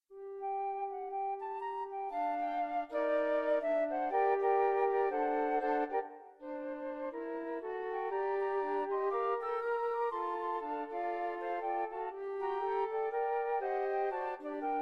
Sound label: FLUTE, jazz arrangement for flute quartet; greensleeves; what child is this